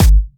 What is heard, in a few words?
Bassdrums